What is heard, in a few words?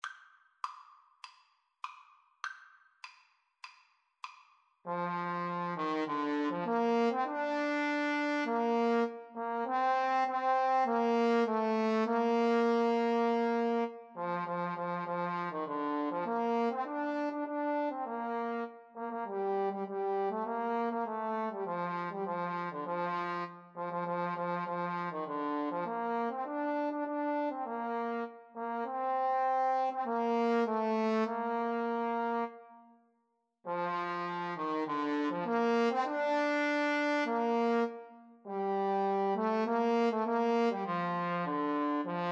battle hymn, Trombone Duet